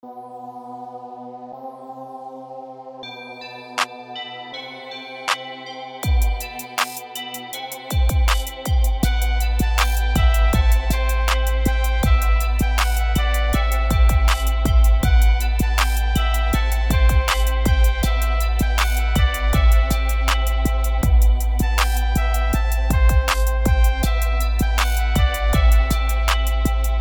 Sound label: Deep Trap